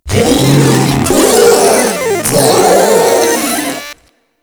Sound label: Tentacle Swing Scream, Proto Infested Ancient; Warframe; Abilities